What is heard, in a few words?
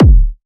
Techno, Kick; Techno Sounds 2024